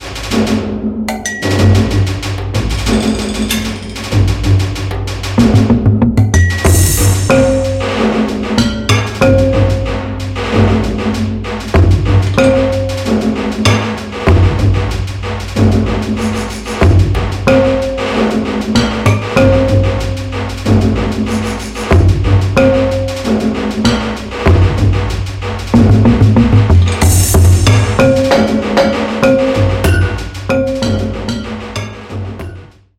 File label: FUNK Perky; BRIDGE